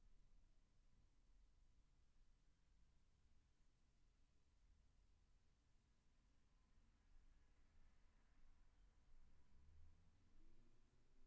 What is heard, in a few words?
room tone